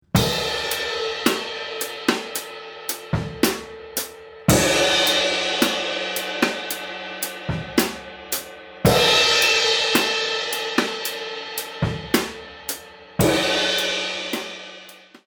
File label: Drum Overhead